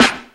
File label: original snare sample a sharp key